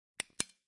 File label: flashlight switch; flashlight sound